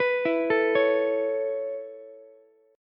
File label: B 7th Suspended 2nd Suspended 4th down Ukulele, chord; B7sus2sus4; arpeggiated; JGuitar